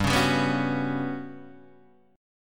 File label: G Major 7th Flat 5th, Guitar, DADGAD; down